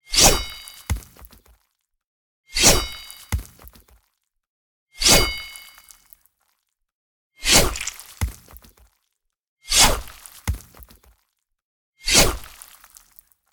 Beheading With A Sword Slashing Hit With A Sword

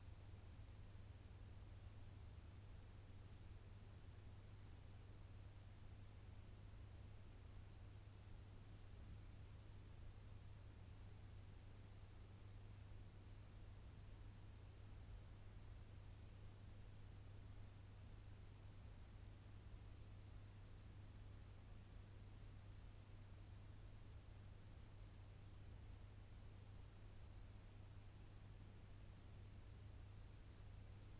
Room Tone Hallway Small House Calm Environment

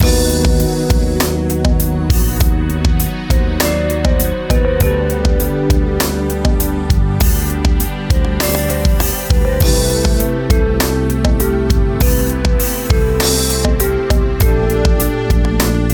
Loop

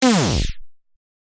Dj Brake

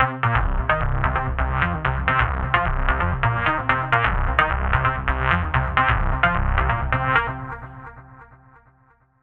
Bassloop 130bpm Bflat

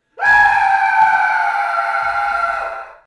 Scream

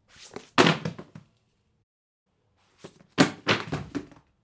Dropping Pile Of Books

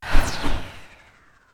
Breath Ghost Vanish

